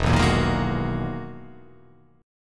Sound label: E-Major Sharp 11th E